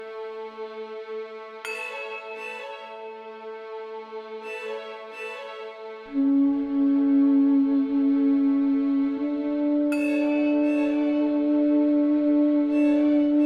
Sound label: Victory Loop; Almightree The Last Dreamer